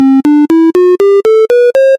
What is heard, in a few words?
diatonic scale